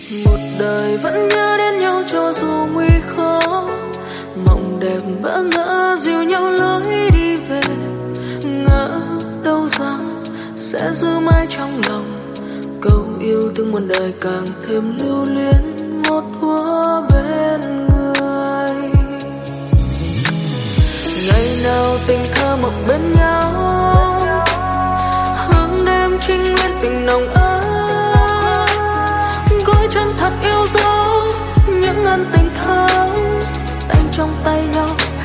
Lofi; nhac chuong

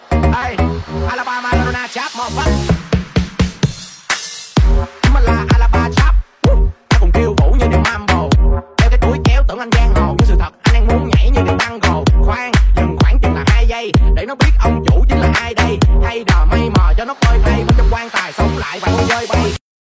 Alaba Trap Remix

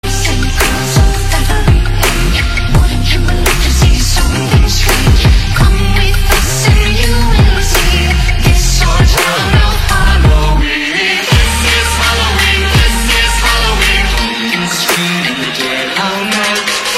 nhac chuong halloween dj night trap mix

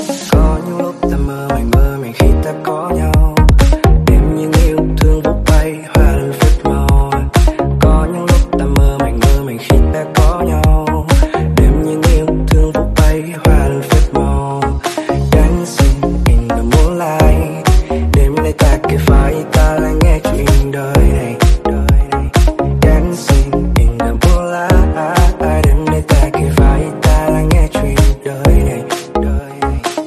Remix; nhac chuong

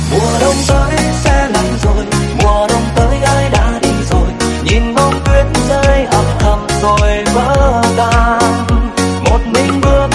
Remix; nhac chuong

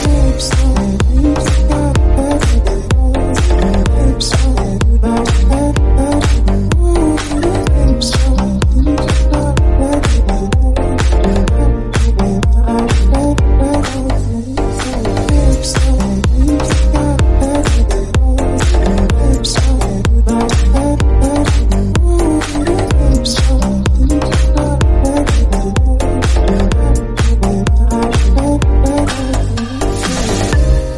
Remix; Tiktok 2021; nhac chuong

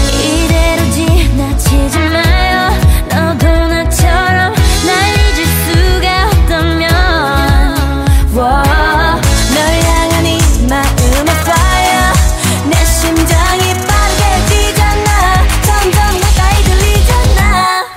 Whistle; nhac han quoc

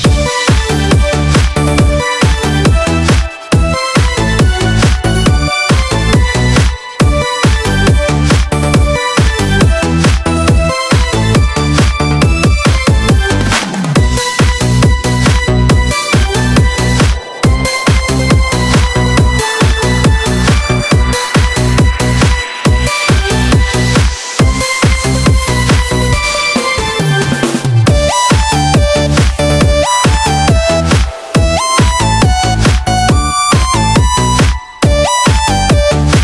Jingle Bells Remix, Nhac Chuong; nhac quoc te

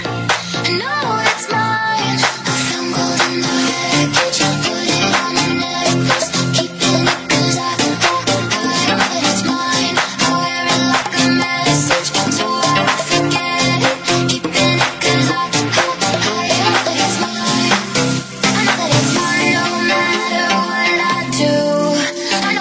Remix

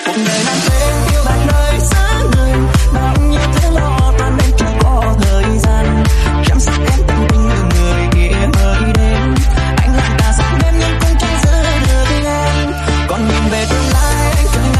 Remix; nhac chuong

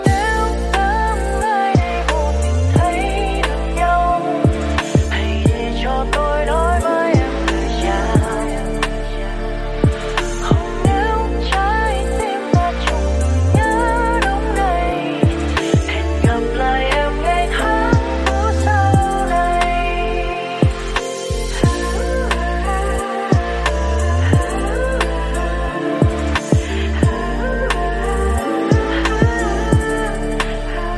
Lofi; nhac chuong

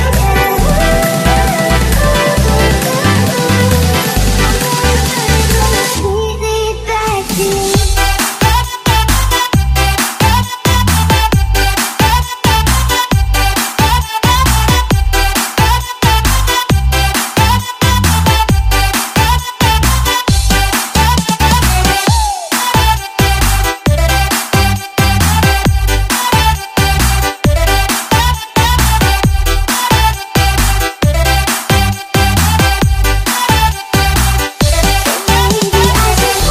Remix Funky Night Beat

